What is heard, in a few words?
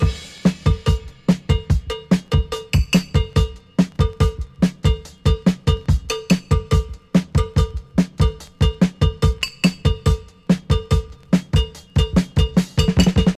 144 bpm high quality breakbeat b key, Free drum loop sample - kick tuned to the B note